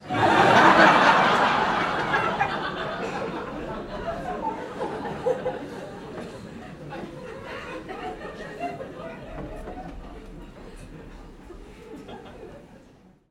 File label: human audience laughter comedy club x200 people